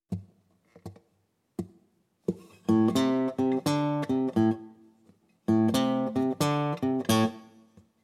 Open Tunings For Blues Rock Guitar

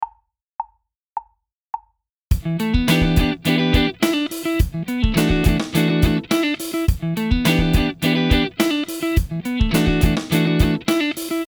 Minor 7ths

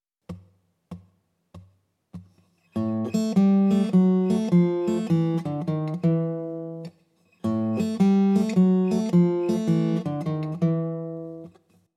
Open Tunings For Blues Rock Guitar; An Easy Guide To Open Tunings For Blues Rock Guitar

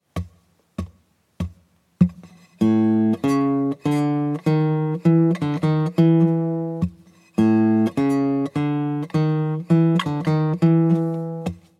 Blues Guitar Turnaround

